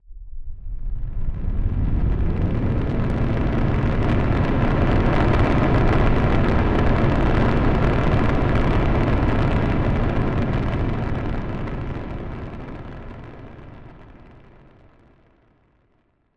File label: rescueship leaving; The Swapper